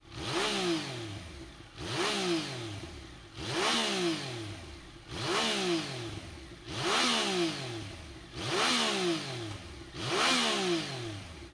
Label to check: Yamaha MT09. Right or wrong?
Right